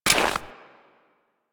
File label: Step Ice, footsteps